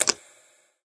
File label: click; smite; chronos